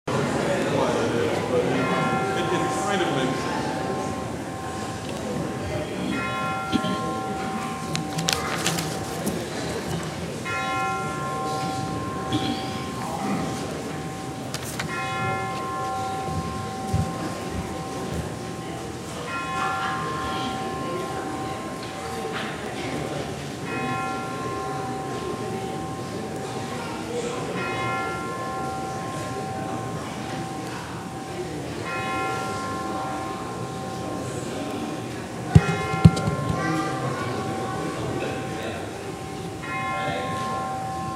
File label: Ocean Daybreak